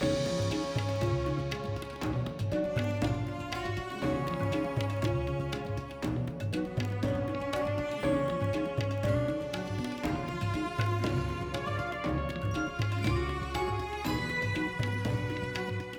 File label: BGM Free Spin; Genies Wild